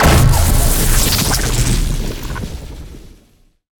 Chain Lightning Fire Burst; Evolve; Elder Kraken

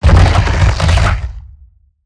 Roll Surface End; Evolve; Behemoth

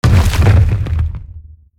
Rock Throw Impact; Evolve; Meteor Goliath

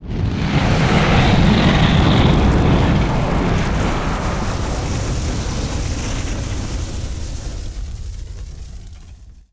fog fire

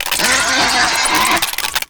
Spider Trap Activate; Evolve; Gorgon

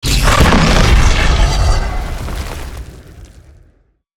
Charge Attack Vox FLAME; Evolve; Meteor Goliath

